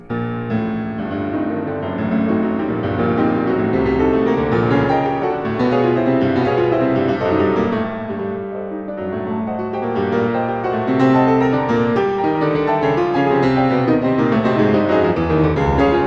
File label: Prelude in G minor, Piano; Chopin; Preludes